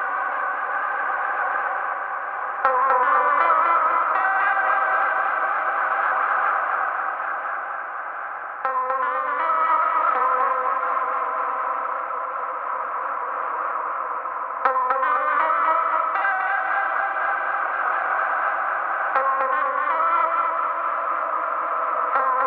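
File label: 80BPM A major Lead Piano; BRM Vol2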